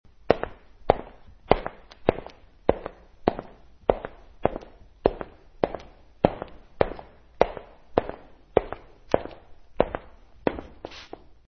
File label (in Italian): passi scarpe cuoio su legno